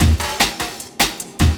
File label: LOOP, 150 BPM, Drum & Bass